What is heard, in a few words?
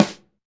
SD, KIT Real-Kit; 90 s Sample CDs; Sound & Vision - Gigapack I CD 1 (Roland); Real-Kit m 6